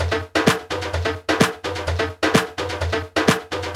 Latin House Loop 128 BPM; Vengeance Samples

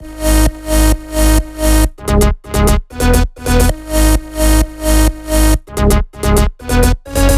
130BPM Melody; Vengeance Samples; Worship Kit

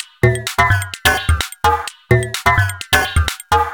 Minimal Loops

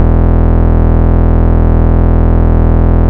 Root E, Bass

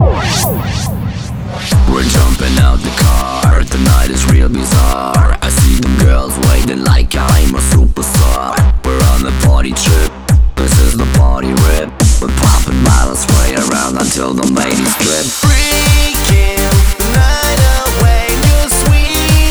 Pitched Mix, 140 BPM; Vengeance Samples; Song Kits

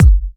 Bassdrum; Vengeance Samples

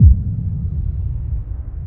Sub Boom, 128 BPM; FX; Vengeance Samples; Vocal-Hook Kits; Song Kit

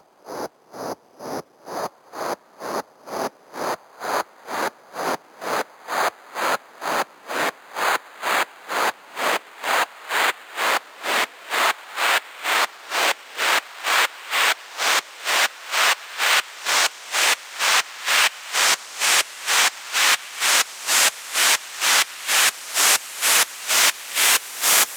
Sweeps Long

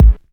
Bassdrums

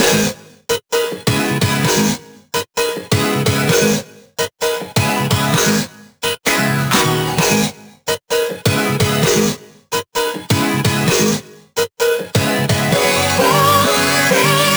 Root G# 130 BPM; Vengeance Samples; VEH3 Special Sounds

